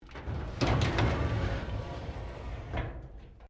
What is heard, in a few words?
Drawer Metal Filing Cabinet Open; Various domestic drawers; Household